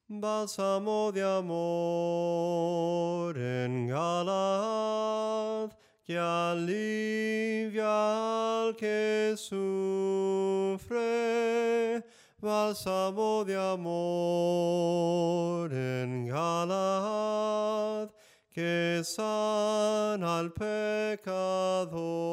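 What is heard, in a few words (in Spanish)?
BALSAMO DE AMOR EN GALAAD SOPRANO CANTADO, himnos a capella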